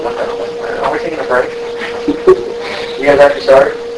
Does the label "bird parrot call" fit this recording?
No